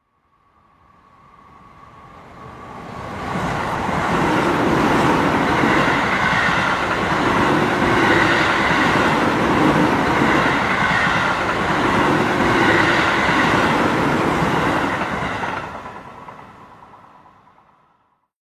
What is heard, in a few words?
train on tracks moving; افکت صوتی